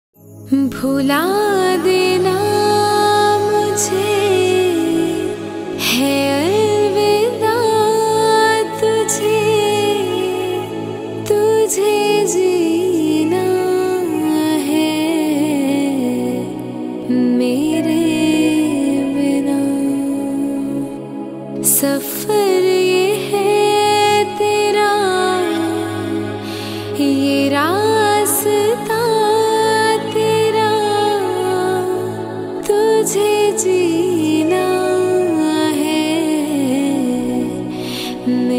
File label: Female Breakup Hindi Sad Song Ringtone